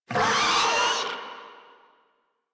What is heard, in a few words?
Infested Charger Death; Warframe